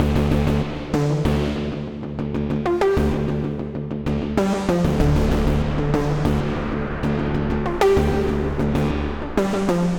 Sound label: Residual Plucks, Dmin 96 bpm; MIDI Clips; Tonal; Motifs; Ableton; Mood Reel